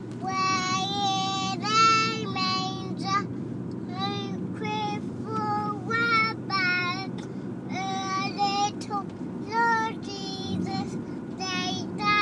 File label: singing